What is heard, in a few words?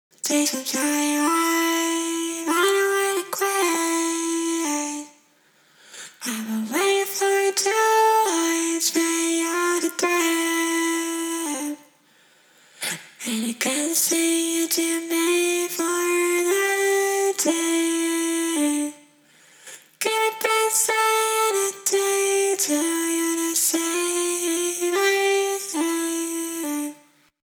writing vox